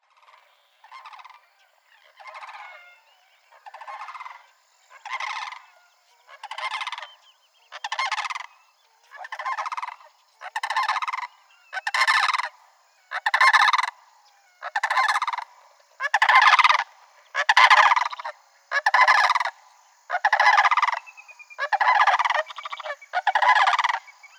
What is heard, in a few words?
Sandhill Crane three in flight over marsh; Orlando Wetlands